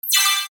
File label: Chime Digital Musical Shimmer; Production Element Imaging Element Accent Transition; Multimedia; Chimes; Blastwave